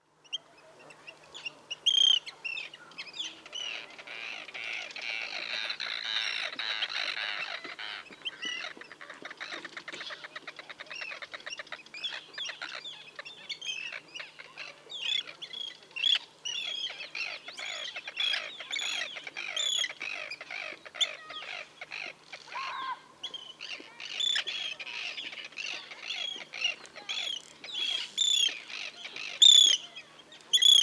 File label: Arctic Tern Sterna paradisaea